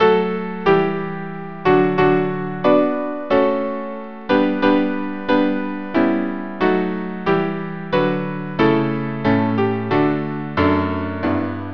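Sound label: Peasant Song violin piano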